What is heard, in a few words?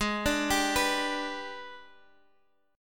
Minor Major 7th Flat 5th, Guitar, Standard; G#mM7b5 arpeggiated; x,11,12,12,12,x